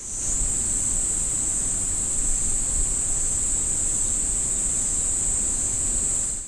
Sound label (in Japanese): niiniizemi; zukan